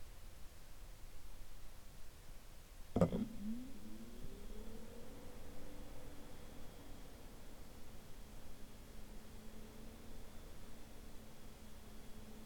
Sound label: sound from the keyboard; g9 fan noise